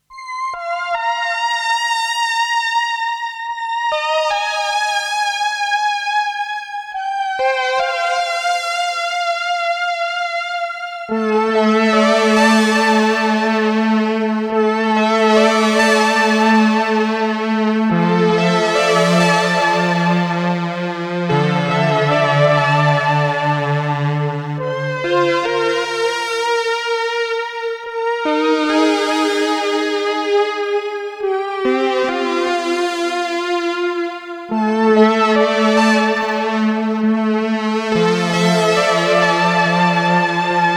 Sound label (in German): Opus 3 String Brass mit Modulation